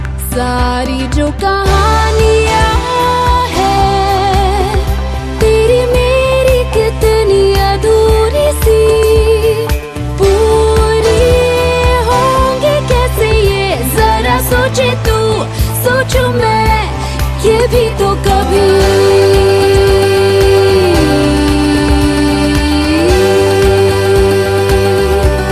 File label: New Hindi Ringtone